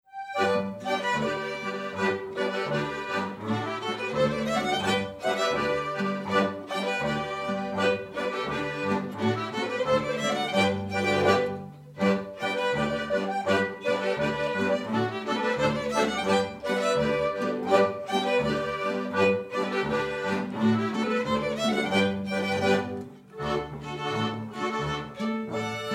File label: Attergau Boarischer